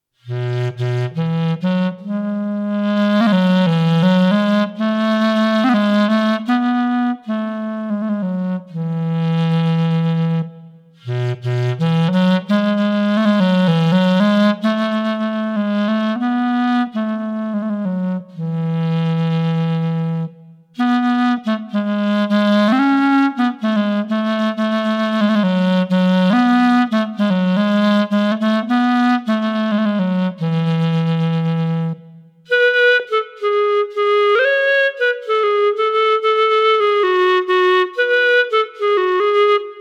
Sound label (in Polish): W zlobie lezy clarinet